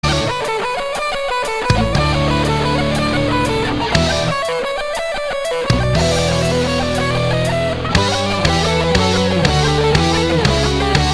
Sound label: rock; Doraemon; mobiltelefon